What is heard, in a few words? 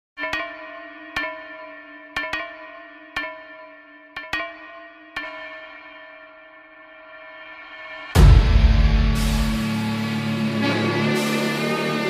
mass bgm tamil attitude bgm ringtone; kaithi movie; karthi